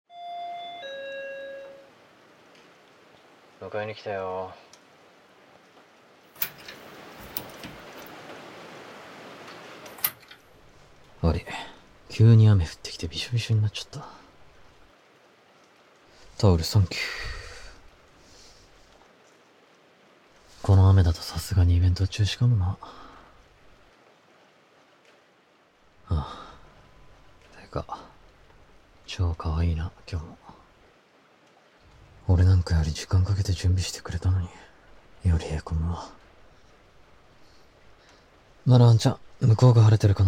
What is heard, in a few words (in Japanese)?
導入~耳舐め